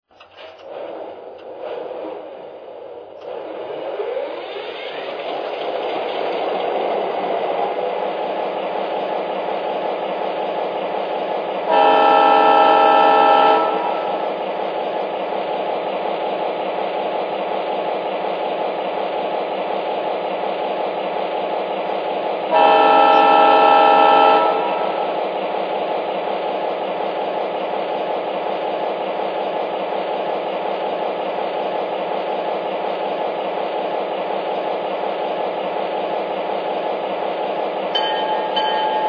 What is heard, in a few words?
gp40; FEC